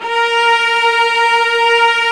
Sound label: ARCO CELLI; OMI - Universe of Sounds